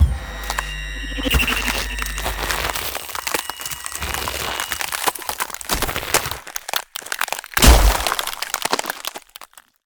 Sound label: Ice Spell Transformation Shape Shifting; Arctic Aura